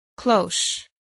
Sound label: cloche